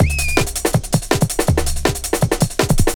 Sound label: breaks 162; minihalfs